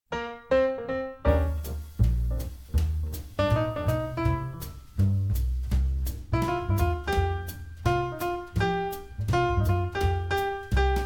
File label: trio instrumental